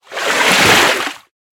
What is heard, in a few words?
bonus symbol barrel hide; Hungry Shark; Wazdan